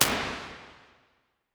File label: Dark Plate; 2 Plates; M-to-S; Reverbs; Load Into Fruity Convolver; flstudio